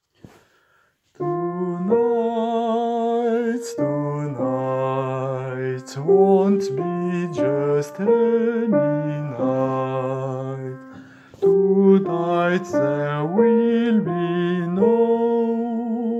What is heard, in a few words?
tonight alto